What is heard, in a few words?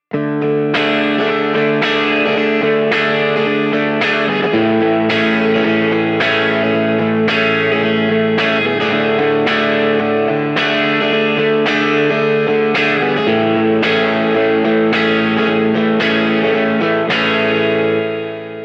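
Electric Guitar; v76 pre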